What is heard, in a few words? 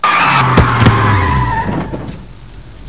car stop